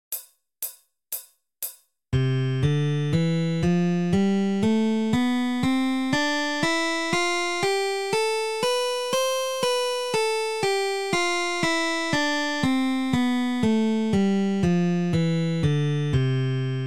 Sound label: C major scale excercise, guitar